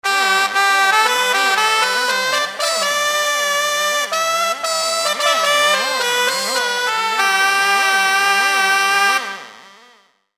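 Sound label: TTP Hurdy Gurdy, V-Synth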